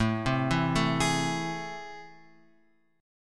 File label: A Minor Major 7th